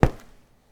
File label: Footsteps Concrete Asphalt Street